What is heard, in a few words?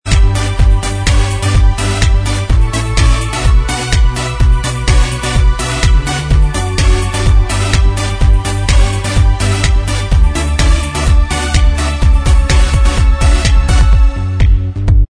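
instrumental, backing track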